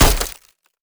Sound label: impact glass shield; Escape from Tarkov